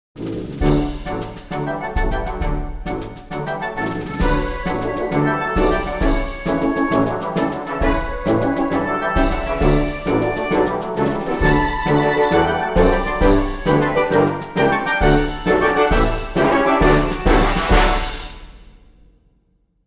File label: marching band, happy birthday